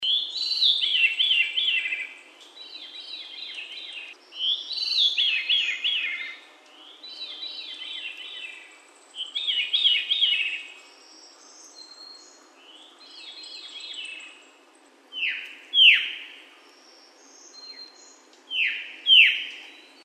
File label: Verry Duel, allegheny plateau